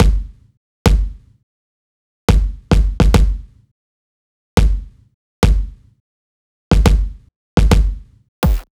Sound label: kick loop 105; Pop; Drums; Kick Loops; greenhill; Slate; SD PASADENA Pop Sample Pack